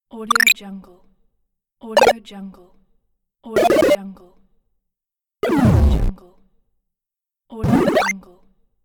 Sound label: pixelated game efx